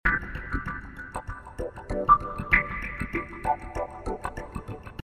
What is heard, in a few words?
Swinglefunk C